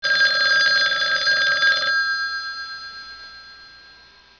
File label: RING